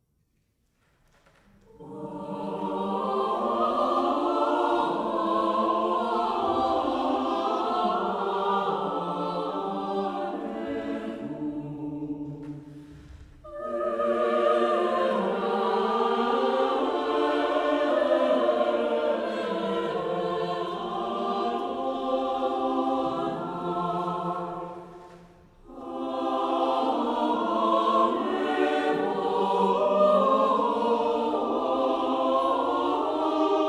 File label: Recital Choir; Barechu written by Salamone Rossi; USC